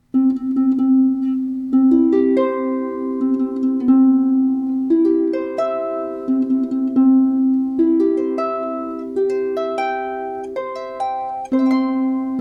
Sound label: Harp; Wedding March